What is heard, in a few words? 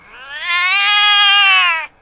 gatti cats; animali